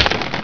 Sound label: wood